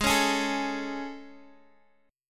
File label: A Major 7th G sharp